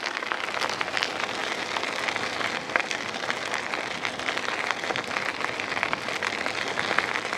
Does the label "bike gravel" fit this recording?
Yes